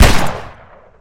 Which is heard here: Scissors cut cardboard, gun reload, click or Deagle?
Deagle